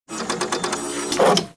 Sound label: label printer; Tools; Barotrauma; EK modern